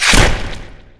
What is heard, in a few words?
backstab; weapons; dota; riki